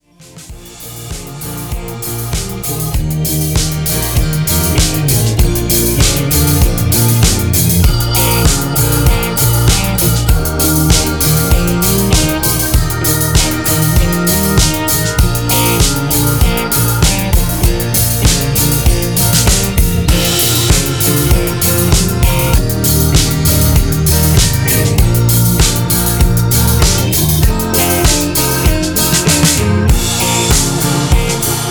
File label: Disco Blues in Bb